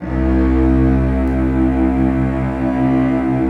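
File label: celli sustain c2; Sonatina Symphonic Orchestra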